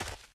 grass, footsteps, run; Left 4 Dead 2

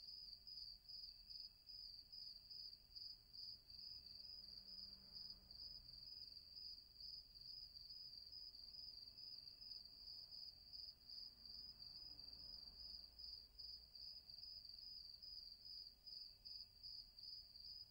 night; ambience; AssaultCube

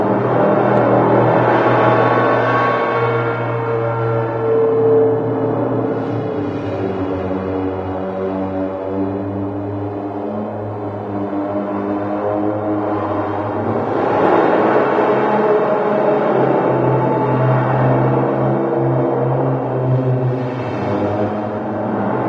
wall ambient loop, machines; Garry's Mod; hl2

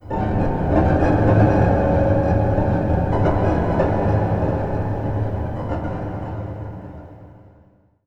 infection, music; Left 4 Dead 2

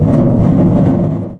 pontoon scrape smooth, airboat; Garry's Mod; hl2; vehicles

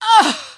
pain, female; npc; Garry's Mod; hl2

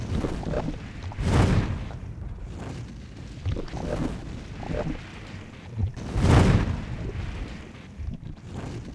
bubble; GrandFantasia

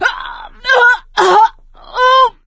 choke; Left 4 Dead 2; producer

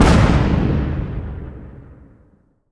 ship hit big effect; Hearts of Iron IV Death or Dishonor

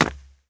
jump; GrandFantasia

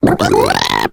female boomer warning; voice; Left 4 Dead 2

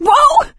ledge hang slip; Left 4 Dead 2; producer

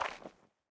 footstep; Mount&Blade Warband

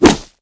wooden hit high armor low damage; Mount&Blade Warband

